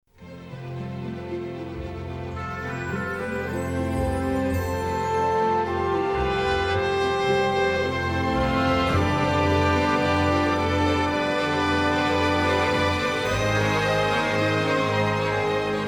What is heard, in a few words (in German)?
Morgenstimmung; GARDA